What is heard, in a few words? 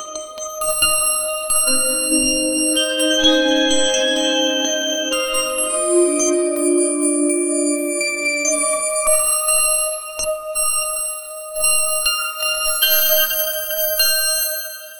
innovative synthetic melo